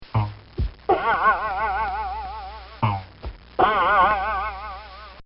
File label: Cartoon Sound Effects Plop Bong; Cartoon Sound Design